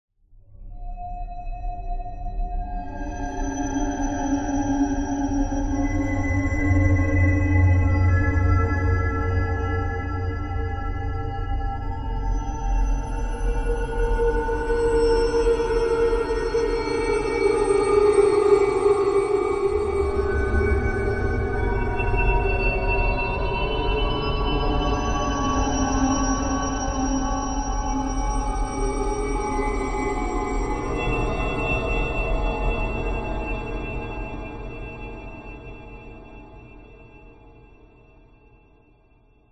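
horror drone atmos dark sinister eerie lfe howling choir like metallic shrill; elements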